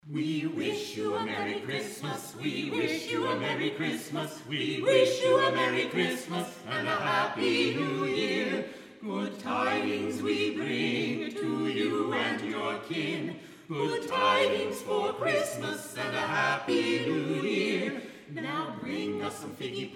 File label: Carolers; San Jose; This San Jose Carolers group is the premier Christmas Caroling group in the South Bay and Silicon